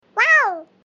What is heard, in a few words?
favorite click